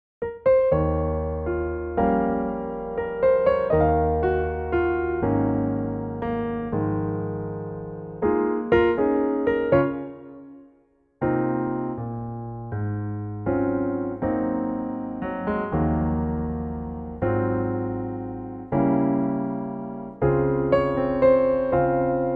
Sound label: Db piano; key - Db - vocal range - Ab to C (optional Db); 2024 Pianotrak arrangement of this lovely old song